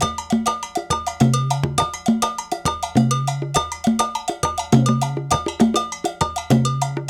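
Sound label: 135 bpm, Latin; Spectrasonics; Supreme Beats; African Contemporary; Latin MM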